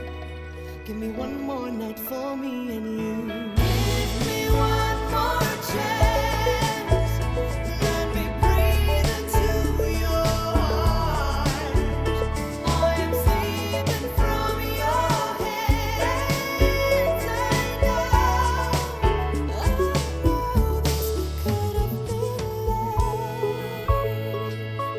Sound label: DRUMS ATMOS binaural room; Acustica